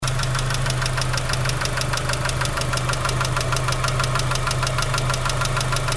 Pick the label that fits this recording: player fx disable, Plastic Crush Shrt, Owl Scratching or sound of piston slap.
sound of piston slap